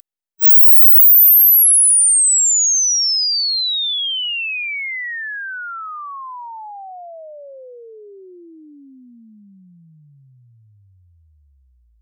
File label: ESS 50-24k; ESS 5+15+5; SouthAfrica; AgoraAutomated